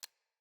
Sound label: Sparrow Landing Foley Sparrow Land; Dendria; Envoy